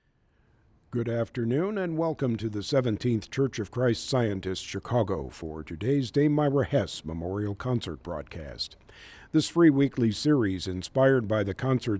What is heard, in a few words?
Piano; wfmt; Dame Myra Hess